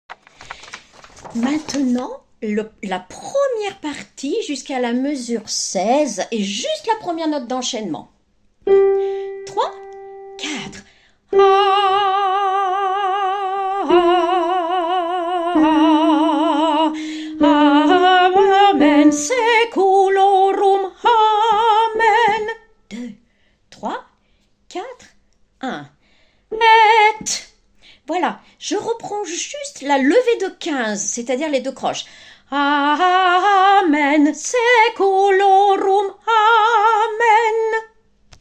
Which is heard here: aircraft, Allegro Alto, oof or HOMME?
Allegro Alto